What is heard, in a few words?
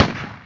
gunshot